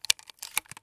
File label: mr133 shell in mag; Escape from Tarkov